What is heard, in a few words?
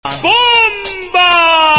bomba; cstrike